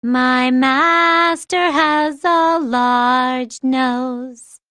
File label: sing; p4u; elizabeth